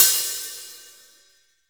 big open hat d sharp key

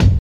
sharp steel kick drum sound G key

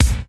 snappy steel kick drum sound c key

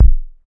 solid kick drum sound g sharp key; kick drum samples

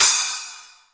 large room open hi hat d key

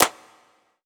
clap sample b key; percussion single hits one shots

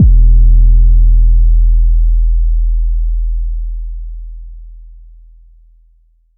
phonk kicks oneshot - 808 G, Trap kick drum; trap kick drums

